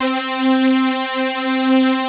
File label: POLY SYNTH; Wizoo - Powered Wave